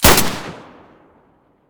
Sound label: m9a3 muzzle mono; beretta